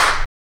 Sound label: RSS PERC